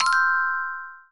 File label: upgrade